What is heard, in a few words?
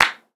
Foley